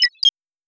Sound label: notification